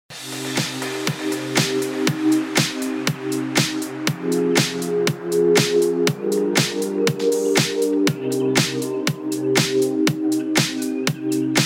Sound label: Ringtone